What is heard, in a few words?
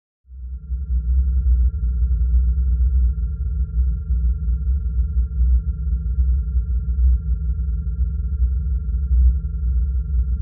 Space Ship Cntrl Rm; spaceship fx